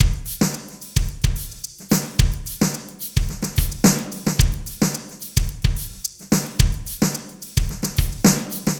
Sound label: hats 109